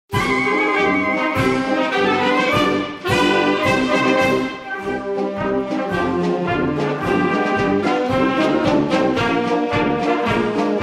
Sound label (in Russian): музыка